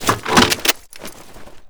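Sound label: weapon foley javelin reload tube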